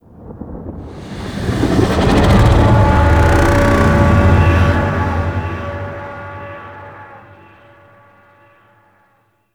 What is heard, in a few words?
Void Dragon Vocal Distant Idle; Enemies; Vocals; Warframe; Duviri